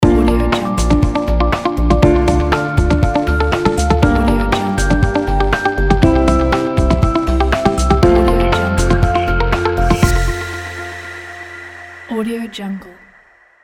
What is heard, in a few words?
corporate logo